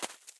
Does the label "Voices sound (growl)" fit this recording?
No